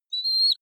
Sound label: offspring eagle call, voegel